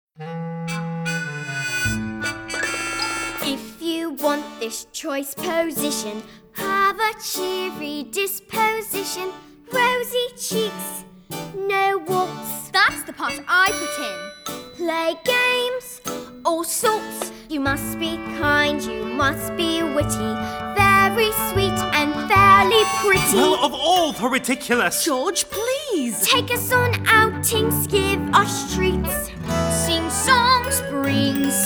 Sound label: Audition Cut with Vocals